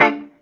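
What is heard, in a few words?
guitar chord Dm; Sounds Good - Roots Reggae; GTR CHD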